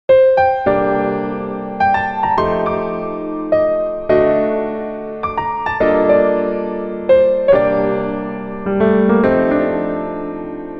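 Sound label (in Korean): Piano version 멜로디MR -1키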